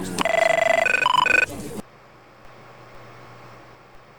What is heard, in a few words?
Ring; Lucent